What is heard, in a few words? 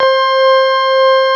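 CX3 organ C5 R, Keyboards Old School; USB Soundscan vol.09; AKAI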